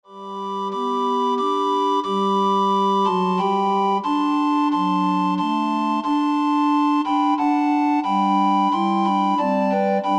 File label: Recorder Ensemble